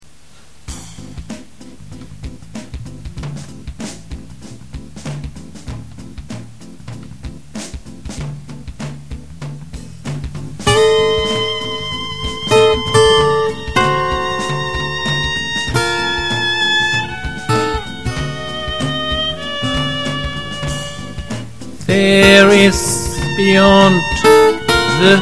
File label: blues